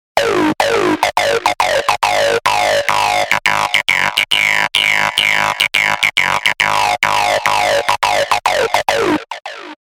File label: Acid Loop G# 105 BPM; Spire; Distorted Reality; ACIDVSLASERS1